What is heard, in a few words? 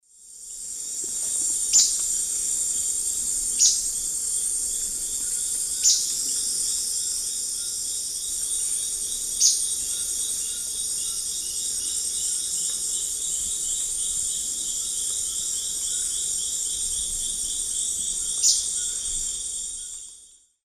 Sclerurus rufigularis, calls; S. America; Eastern Lowlands